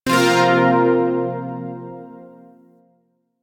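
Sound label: Shawzin Pentatonic Minor Chord; Zariman Shawzin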